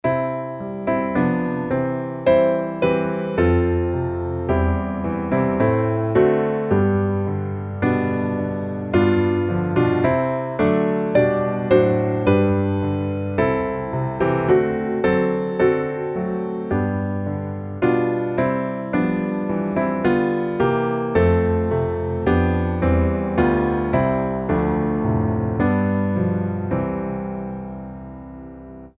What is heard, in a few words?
piano